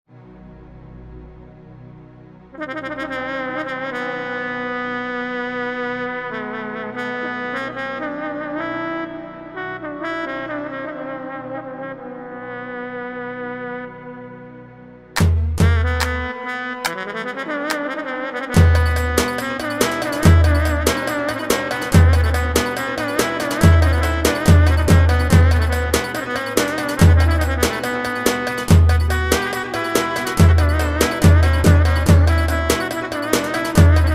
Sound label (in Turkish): balikesir ciftetelli agir flut korg pa4x